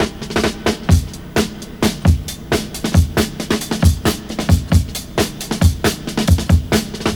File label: intro, breaks